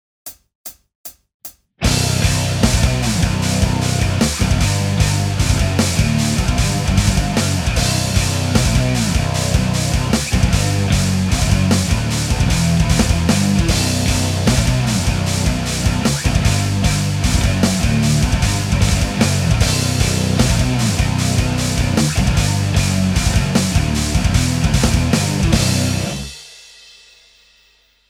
riff

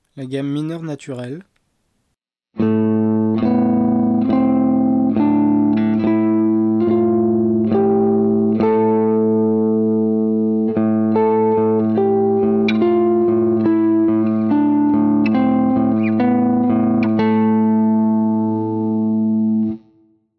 single String min Nat

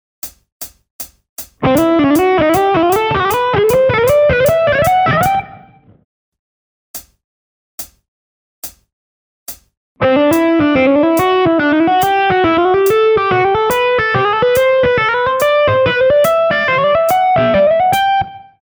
legato

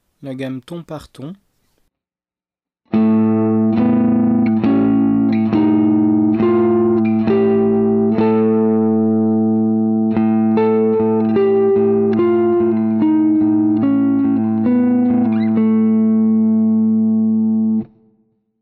single String whole

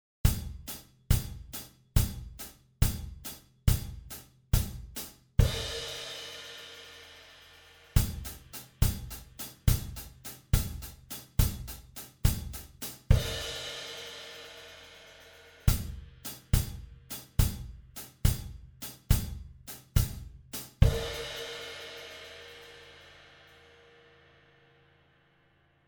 drum shuffle example

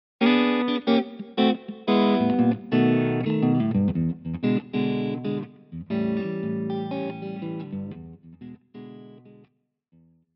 turnaround intro

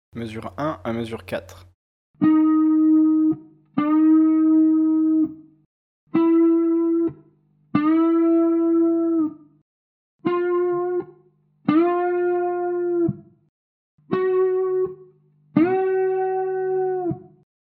bend serie

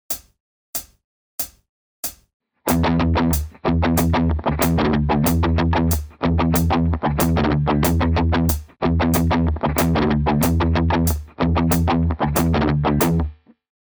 riff

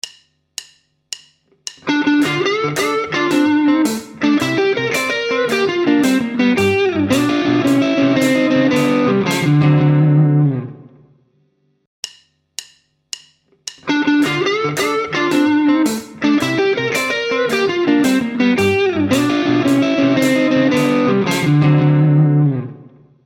chicago lead

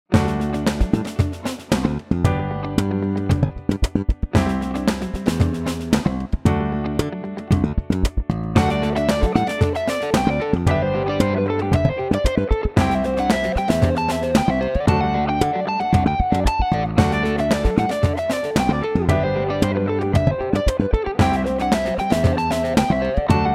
ex impro mixo

